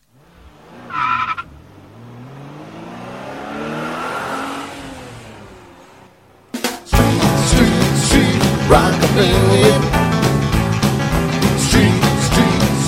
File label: rockabilly street